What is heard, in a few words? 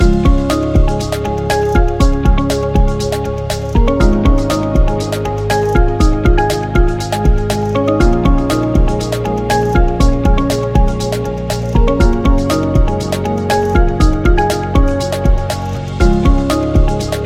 Inspiring Corporate Loop